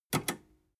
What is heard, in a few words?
metal button robust click fast